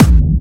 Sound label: Clubby Kicks, Bassdrums